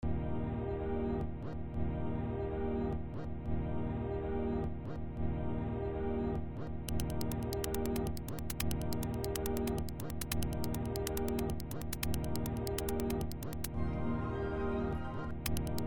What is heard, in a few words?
instrumental; 2006 glitch instrumentals